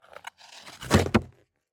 ICE Hockey player run into board

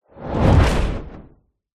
sound design shockwave whoosh into metal slam

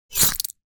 horror knife slice slash skin fleshy gore; zapsplat

